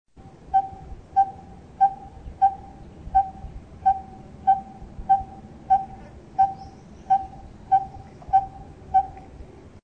coppersmith barbet; birds

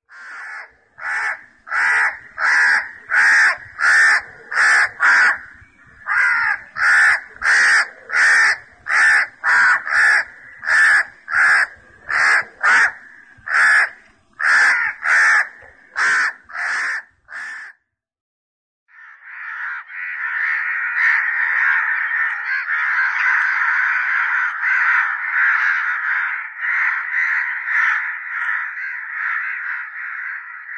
house crow

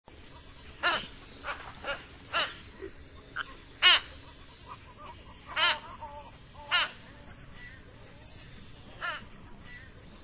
cattle egret; birds

